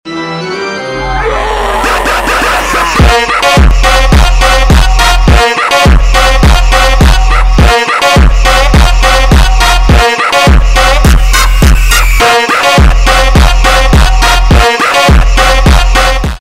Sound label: zombie win